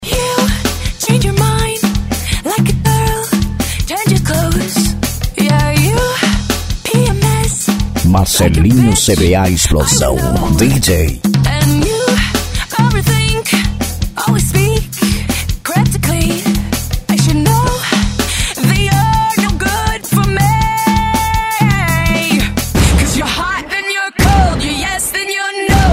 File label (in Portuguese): lambafunk